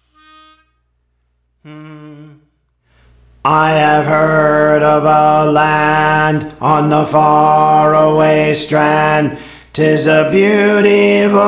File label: Bass; Praise For The Lord Training